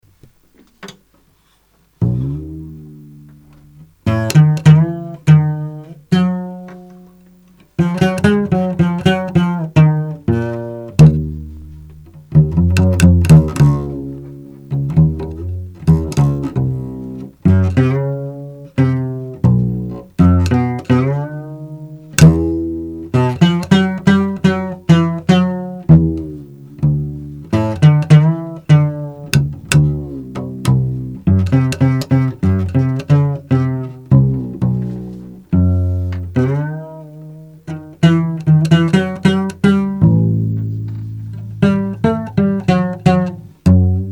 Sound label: Cello